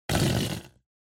Poo Poo Cushion

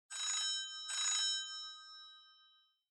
Smartphone Ringtone Speaker

